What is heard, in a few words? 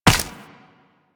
Run Ice, Footsteps; Warframe; Tenno